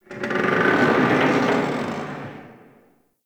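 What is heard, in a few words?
Metal Creak Distant One Off; Ambience; Warframe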